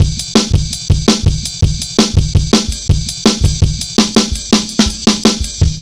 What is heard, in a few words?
165, Drum Loops, Drum & Bass; Total Drum&Bass